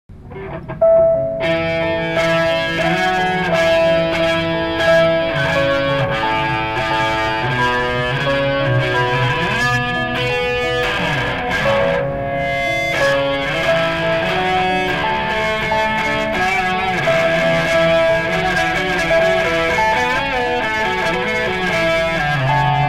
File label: yuyakekoyake with guitar